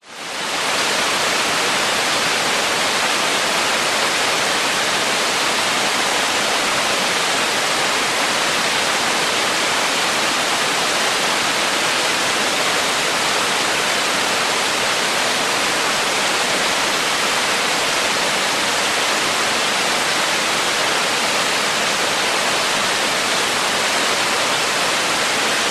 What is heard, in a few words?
nature waterfall 80m high close to base pool